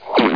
pop; attic

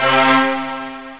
orch brass; OctaMED SoundStudio V1; mirage

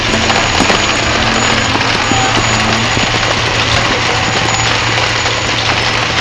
CONVEYOR; Klondike Gold - An Interactive History; dredge

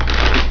Shuffle, poker; Poker Solitaire; Cool Card Games

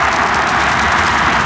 slip; Cross Racing Championship

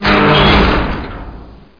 pry door; mission sound

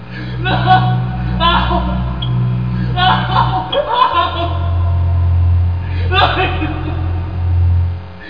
CRY

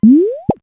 bubl up; 11,025 sample rate; 0.08 seconds

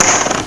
Chip on Chips on Rack; iPoker 2000

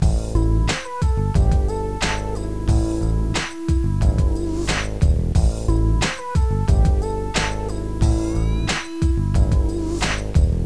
hip hop

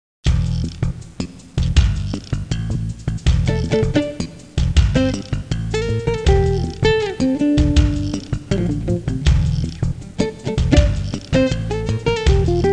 funk; Der Grobe Grafik Sound Trickkasten

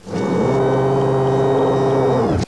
ship; Easy Phonics

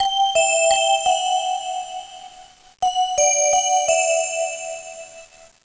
ch bells

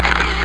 CAMERA

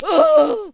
exclamation; uo theme

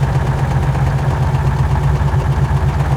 Jeep Idle; 1944 Battle Of The Bulge

